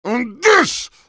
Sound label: meleeing; heavy